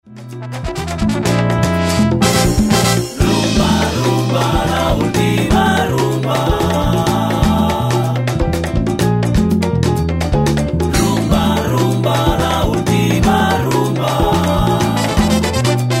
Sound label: rumba